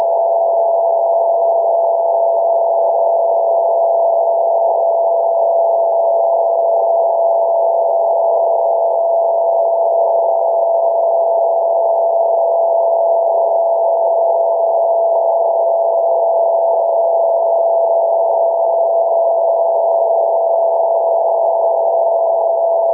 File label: Queqiao